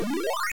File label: ate ghost; Mrs PacMan